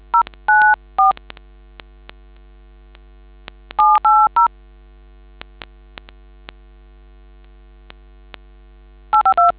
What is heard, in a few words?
dtmf